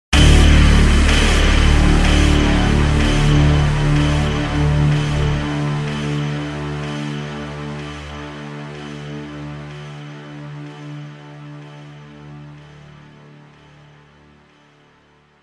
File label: tones